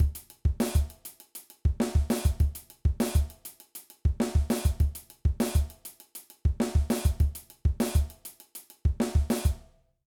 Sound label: Impressive Beat